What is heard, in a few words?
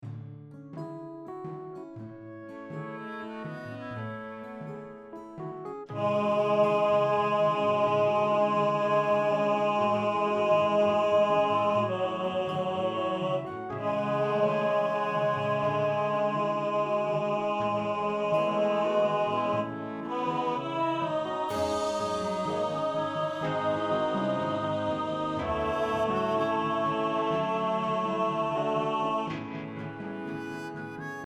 Baritone, rehearsal